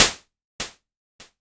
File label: hat, Korg Electribe ER-1